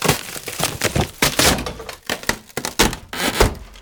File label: Auto Tires Scrape Wood Loop; Automobiles